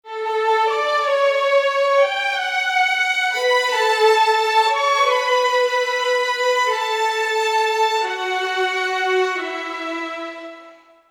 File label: 90 D maj str theme, Orchestral arrangements